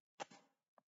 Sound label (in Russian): eto zvuk teleportacii